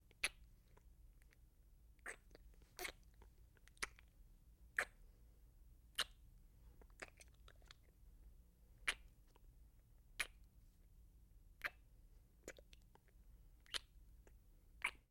Heavy Kissing, Heavy Kissing With Wet Lip Smacks. - Wet Kisses